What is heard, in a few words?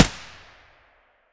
aps muzzle distant; STALKER; IWP